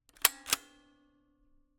Camera, Kodak EK2 Instant, polaroid, large, plastic, springs, snapshot; Eiravaein Works; Vaeyan IV